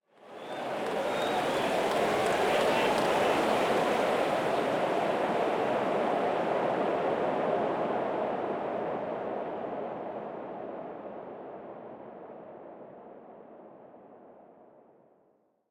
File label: Crowd Ambience; Pitch Black Audio Pong